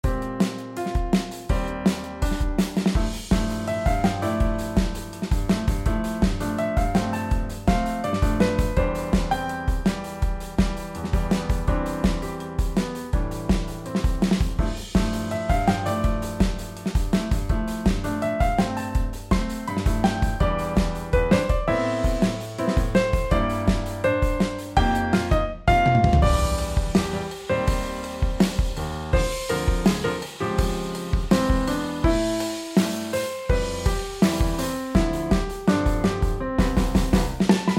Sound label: drum